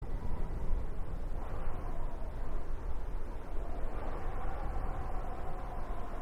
celeste sound wind

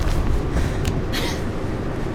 small pull up; mirrors edge

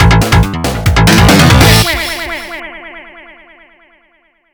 music challenge win; Duck Game

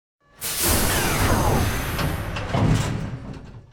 terran structure finish; starcraft2

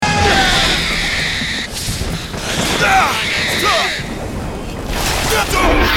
low health; bioshock infinite

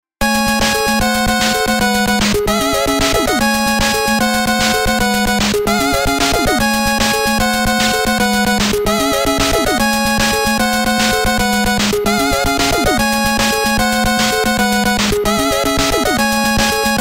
mmsoundtrack; Mega Man 2; gamesoundopedia

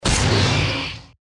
sword hitting basilisk; baldursgate

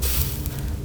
torch put out; We Were Here Forever

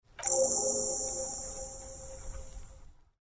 task completed info sound

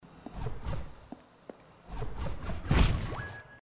hyper dashing; hyper light drifter

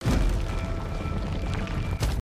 open stone door; ori and the blind forest

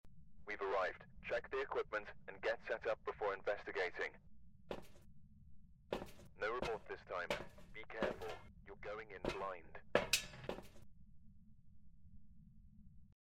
truck start; phasmophobia

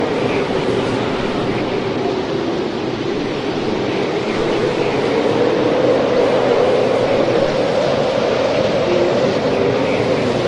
ambiente wind; We Were Here

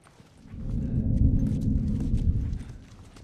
communication sound danger

